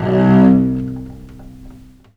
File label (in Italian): C1, Historical Instruments, VIOLE MUT; USB Soundscan vol.23; AKAI